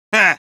laugh short; Medic

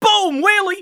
motorcycle; Scout

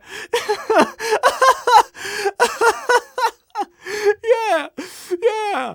Scout laugh long

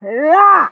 Pyro taunt head exert

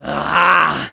Medic negative vocalization, fr; Robot Medic

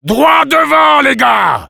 Sniper battle cry, fr; "Droit devant les gars !"

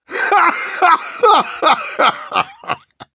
laugh happy

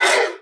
sapper snap

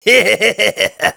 laugh evil, de; Demoman

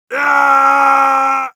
battlecry, ru; «Ийааааа!»; Heavy; Насмешки пулемётчика

